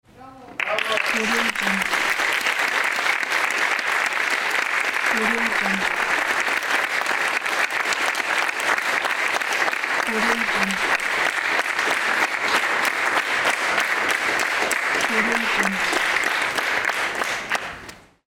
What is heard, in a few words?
applause and bravo